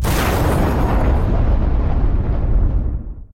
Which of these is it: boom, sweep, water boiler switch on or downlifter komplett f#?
boom